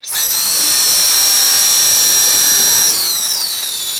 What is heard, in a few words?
pneumatic air grinder spinning and whining